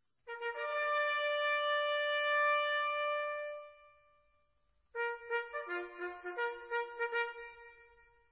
marcha, corneta

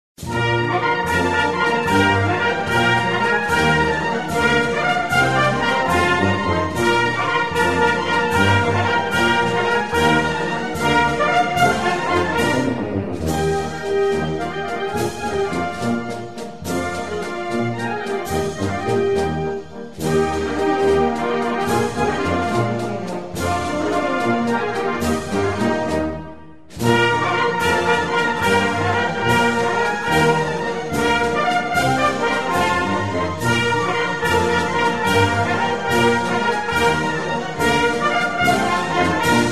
generala, corneta

